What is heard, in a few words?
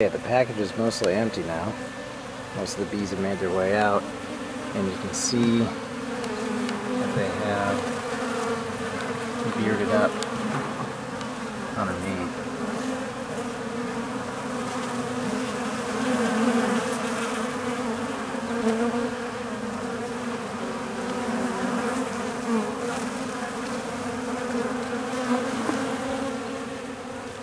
out of package, bees